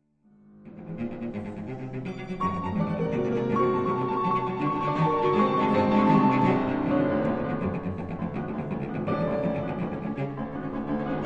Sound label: Piano Trio